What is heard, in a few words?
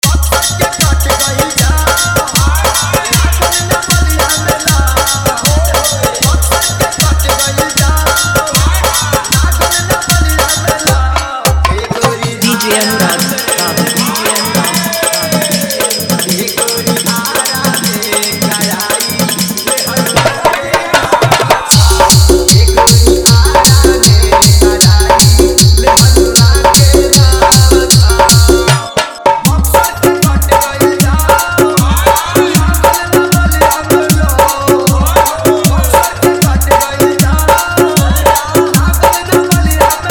Jhan Jhan Bass Mix